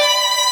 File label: violin; osu